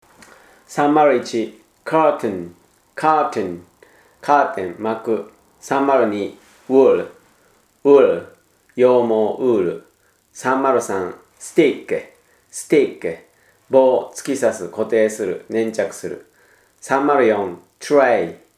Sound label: tango